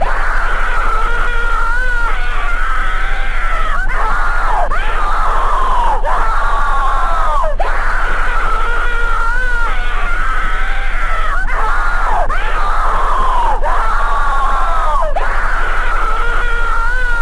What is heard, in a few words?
screams